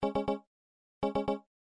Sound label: low health